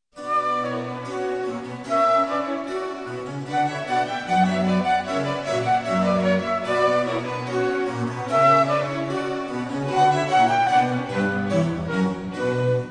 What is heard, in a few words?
bach rondeau main sequence